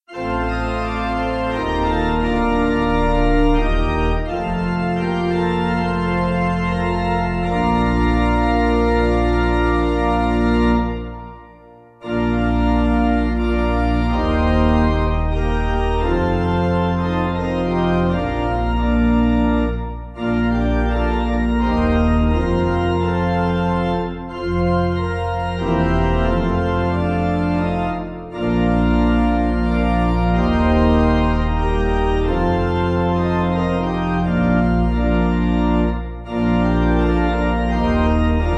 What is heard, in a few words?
Pipe